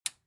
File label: metal button tiny push disable